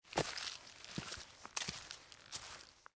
Rock Steps

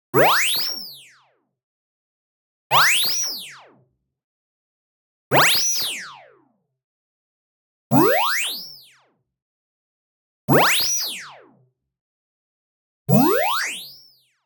tonal, oscillator and noise ascending descending; boom library; Anime Essentials

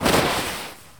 Baseball Slide Grass

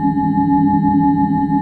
Tractor Beam Loop; SFX Kit; Sci-Fi Loops

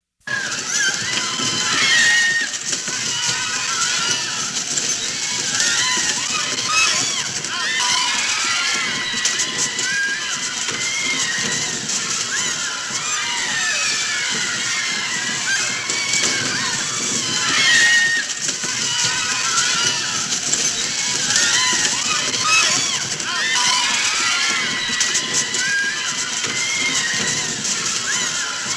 Crowd Panic; Halloween Havoc - Sound Effects; Ktel

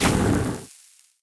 Fire Enemy Footstep; AudioWizards

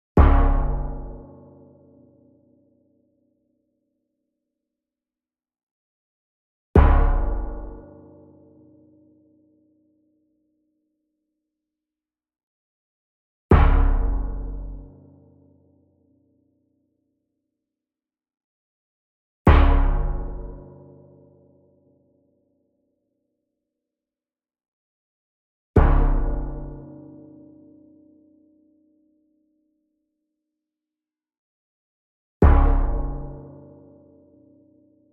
Sweetener Metal Pan Hit Soft Beater Near; boom library; Cinematic Strikes Construction Kit

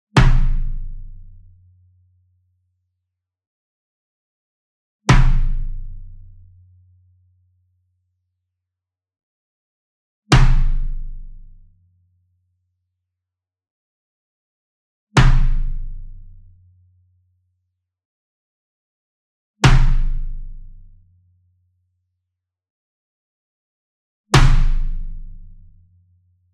Bass Drum Marching Hit Hard Beater Near; SINGLE DRUM; boom library; Cinematic Strikes Construction Kit

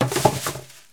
Plastic Container Drop Trash; SFX Kit; Foley - Misc

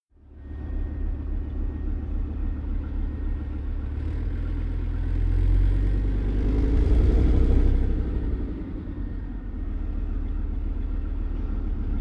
Distant Washingmachine; KMP Film Video Sound Effects

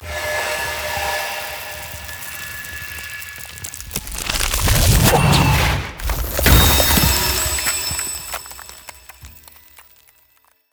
Ice Spell Barrier Defense Icicle Shield; Arctic Aura

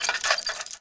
Swing Metal Lamp Rattle; SFX Kit; Foley - Misc

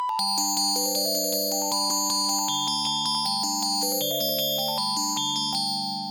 icecream bar car; Blind Drive

